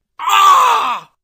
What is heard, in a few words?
uuaaaaaaaa; pogostickman